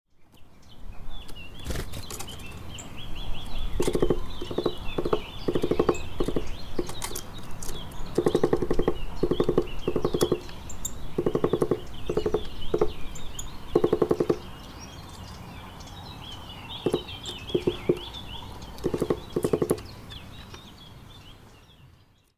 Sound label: Sitta europaea; SETE